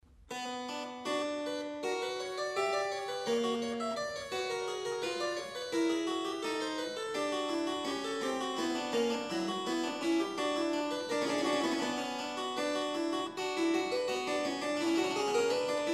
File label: Canon a 2; Musikalisches Opfer BWV 1079; 5 Canones diversi super thema regium